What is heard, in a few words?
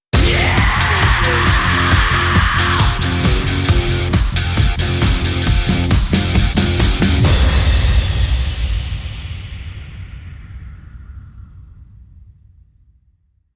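metal, Rock Hard / Heavy, raucous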